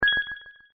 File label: Simple High, notifications; HTC Desire